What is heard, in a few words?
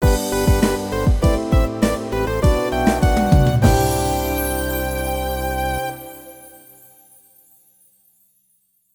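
start jingle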